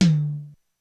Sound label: Hi Tom, Korg DSS-1 Drums